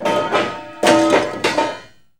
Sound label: PAN DROP, Kitchen Counter; E-MU Producer Series Vol. 2; More Studio Essentials